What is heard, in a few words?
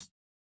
no effect j city pop 1980 hihat